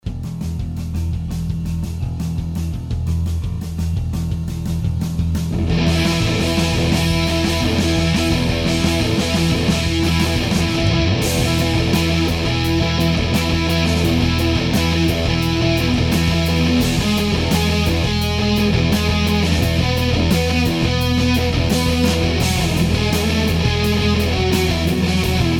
Layered LP Strat no comp

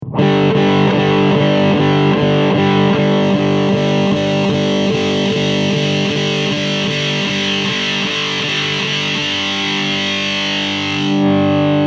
TONE POT RANGE; 73RHBM VS SUF CLONE

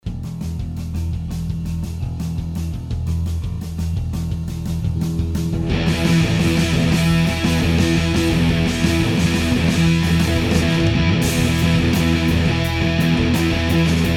Layered LP Strat flat tone w comp

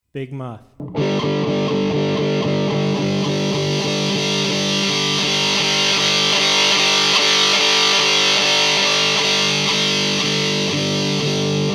V4 Big Muff ICBM SUF Op Amp tone pot sweep

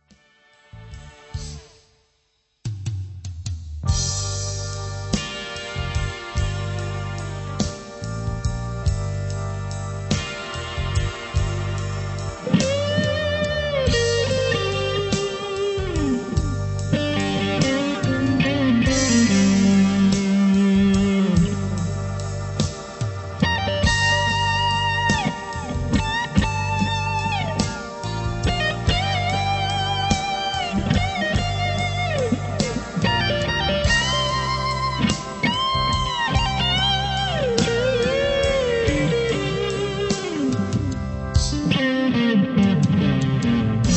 Shine On BK TD Overdrive

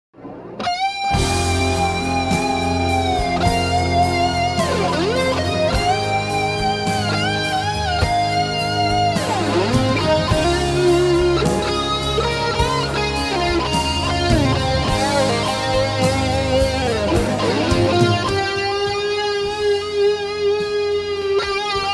solo, Strat, Bassman; EMG; RamsHead; Yamaha RA200

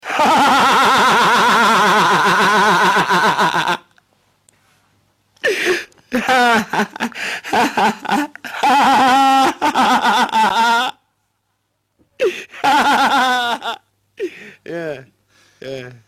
Manifold Laughter

